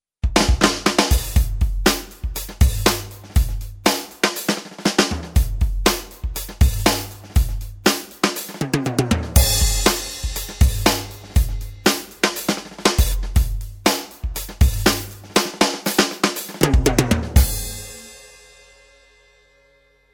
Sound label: Open Kit